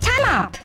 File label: timeout; w00tguy; botparty